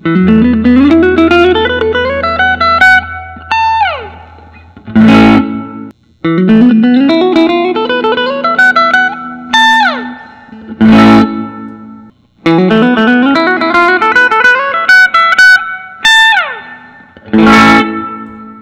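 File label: gibson custom sg special faded cherry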